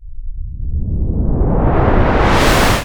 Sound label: IMP WHOOSH